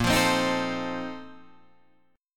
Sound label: Bb Major 7th Suspended 2nd Suspended 4th, Guitar, Drop D; BbM7sus2sus4 strummed; JGuitar